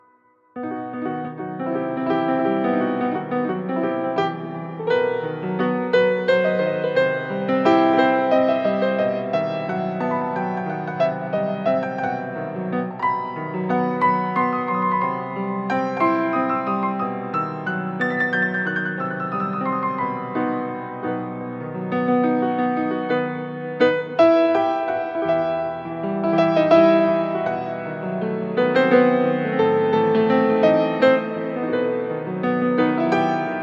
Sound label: Piano